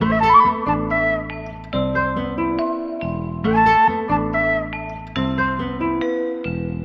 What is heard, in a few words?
flute, Jazzmatazz loops